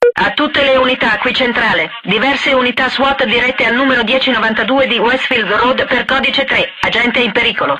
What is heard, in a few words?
panic button